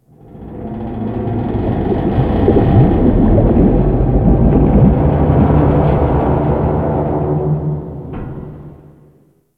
Ocean Hull Creak; Warframe; Grineer Ocean

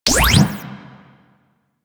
Fire, Weapons; Lato Prime

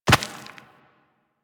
Walk Rock, Footsteps; Warframe; Operator

